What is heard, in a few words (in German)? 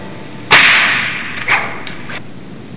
PEITSCHE